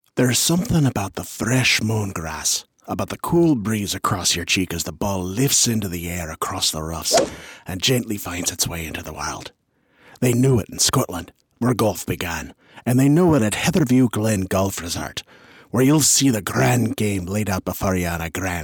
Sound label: Vocal; HEATHERVIEW GLEN (Angus McIan); Medium Scottish